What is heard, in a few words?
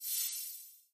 select island; Skillzgaming; battle royale desktop